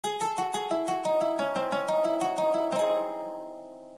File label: Cithara